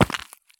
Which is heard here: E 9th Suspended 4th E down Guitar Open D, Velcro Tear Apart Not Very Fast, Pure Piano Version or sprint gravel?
sprint gravel